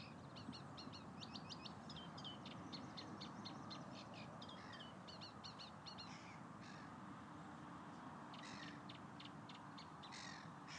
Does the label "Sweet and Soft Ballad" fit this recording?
No